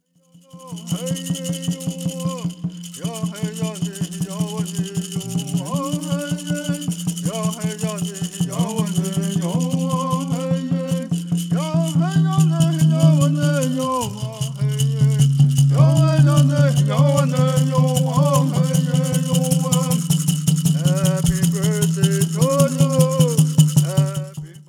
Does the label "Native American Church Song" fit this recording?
Yes